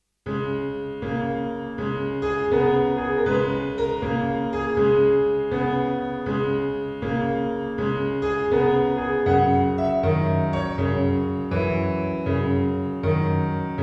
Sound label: piano pop